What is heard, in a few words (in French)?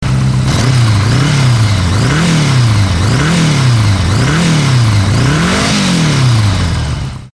Acceleration moto